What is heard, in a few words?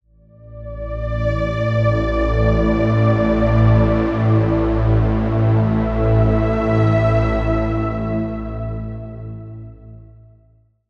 Pad; Routed Sound; Spire Expansion - Reality Maker Vol.1